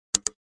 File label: click; E-dawam; camtesia